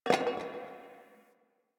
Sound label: Garbage Metal Can